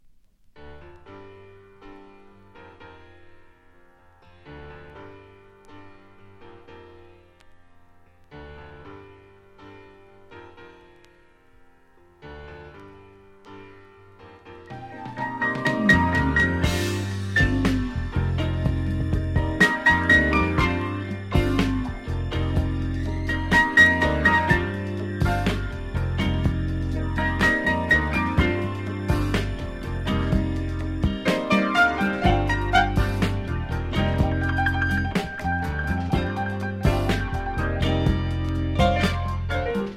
esoteric funk slowdown

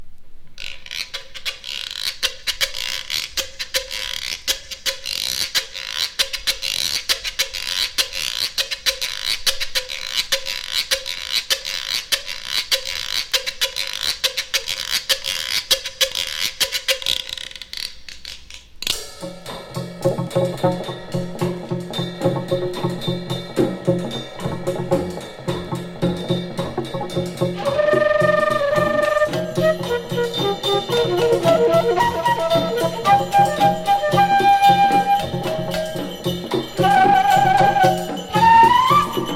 playful flute

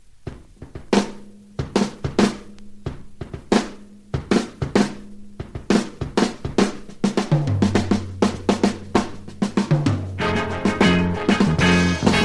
jazz drum

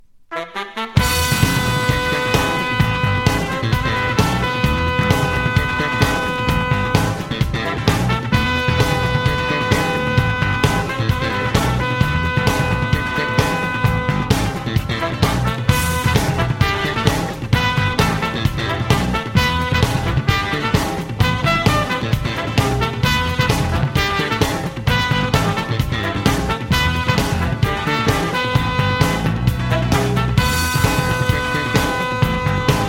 beat jazz

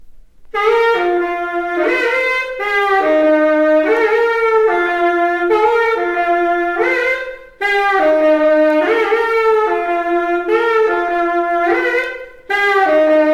tenor

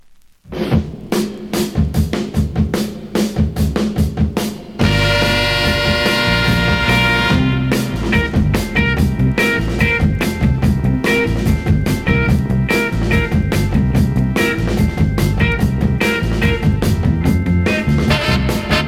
lovin horns

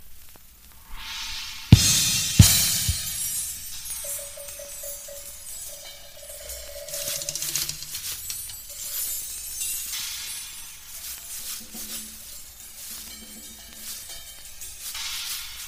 jazz ensemble; arpeggio

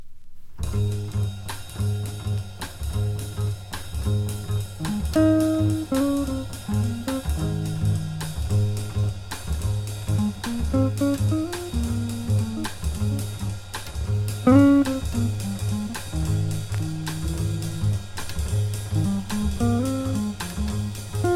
guitar

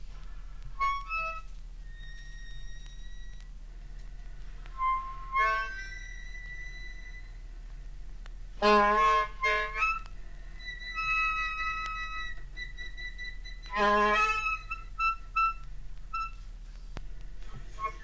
birdsong

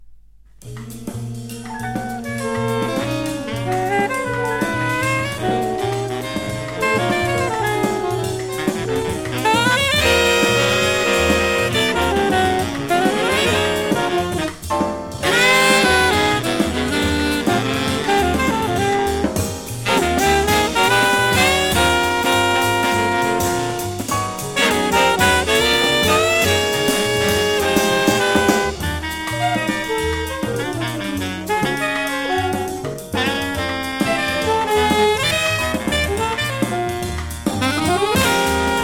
5 saxophones

